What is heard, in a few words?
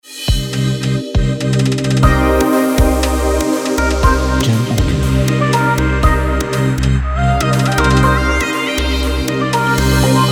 Phonk Trap Logo